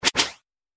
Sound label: Interface Swipe